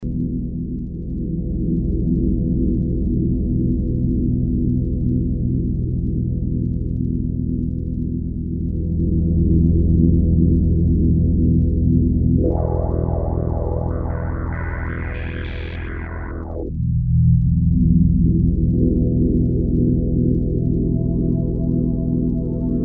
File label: ambient space, synth Roland alpha juno